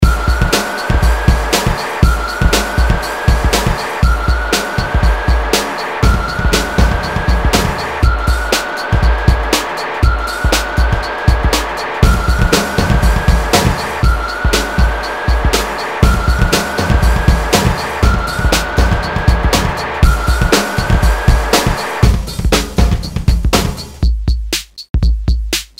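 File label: banjo electro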